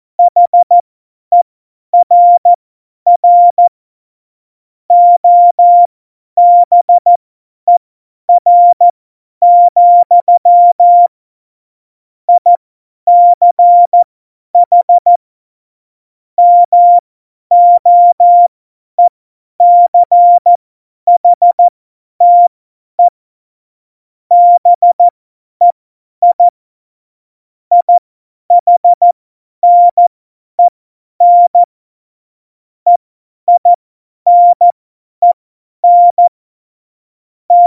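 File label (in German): Witz, Morsekurs, cw morsen; Witze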